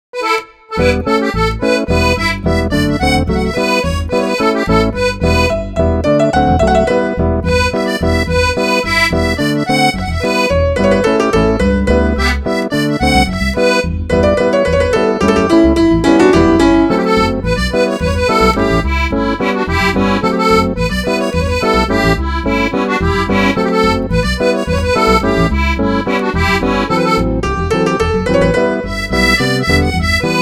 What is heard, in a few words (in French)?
Bourree